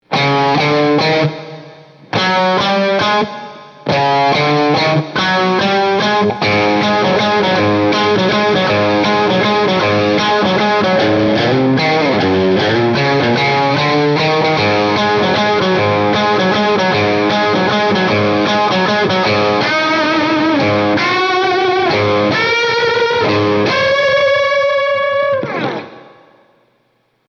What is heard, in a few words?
Bridge w Fuzz, pickups